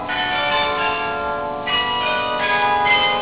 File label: carillons